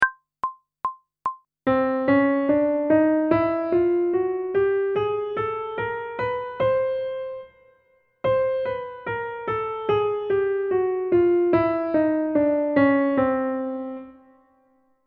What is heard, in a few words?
Chromatic Scale one octave ascending and descending, pop vocals; grade 8; Technical Work